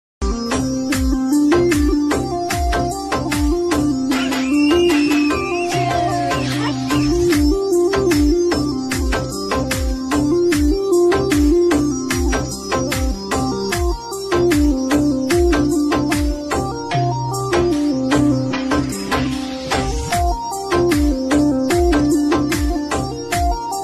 rajasthani ringtone, marwadi ringtone; banna banni

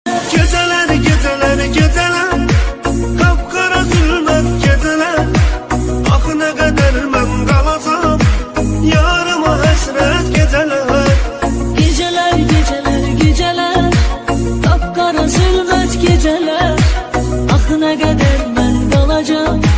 ringtone arabic ringtone

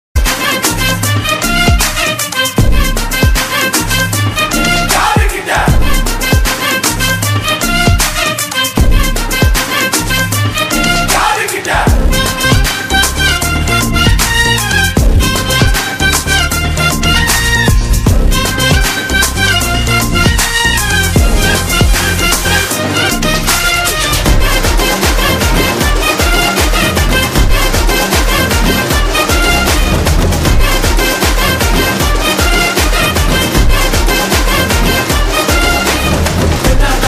song bgm song ringtone; vijay thalapathy

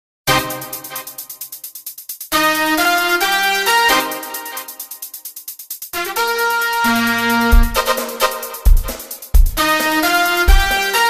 song interlude music

